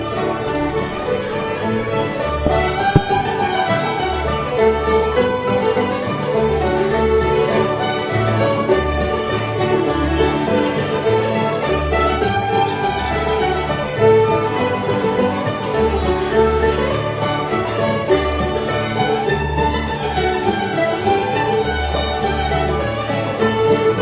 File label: hornpipe; bouchards